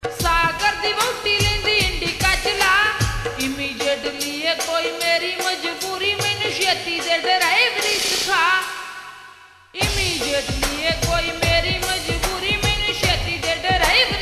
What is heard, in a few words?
Ringtone Slowed Reverb